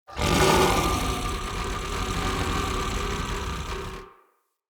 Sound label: Battle Dog Intimidate Growl, Animals; Vocals; Snc Battle Dog